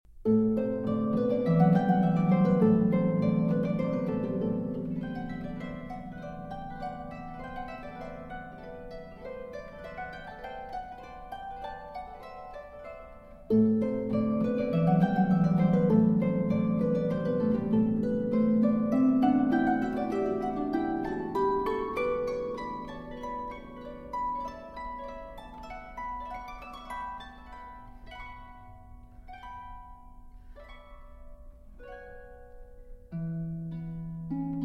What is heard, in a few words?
Sonata for Harp, Op. 127 III. Allegro; Hovhaness